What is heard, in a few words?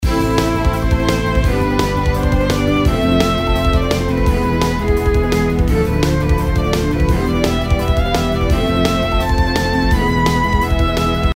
battle